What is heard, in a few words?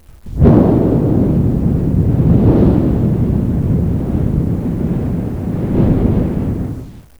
thunder